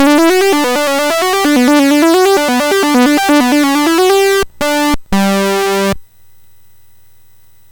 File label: BASIC sid MOS6581R3, GAMES; Terrible Twins